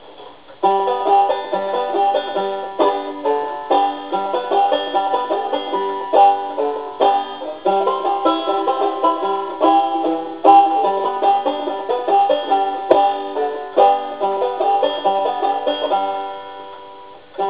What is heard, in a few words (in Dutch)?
speelt banjo; Hildo